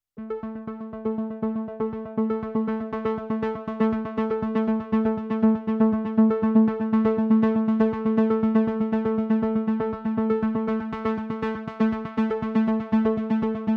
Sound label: Ambient